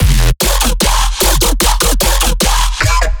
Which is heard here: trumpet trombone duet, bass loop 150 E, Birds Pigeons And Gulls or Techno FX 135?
bass loop 150 E